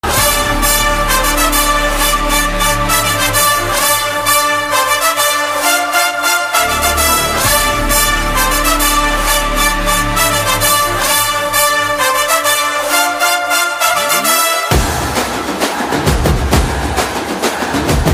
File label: Flag Anthem Bgm; TVK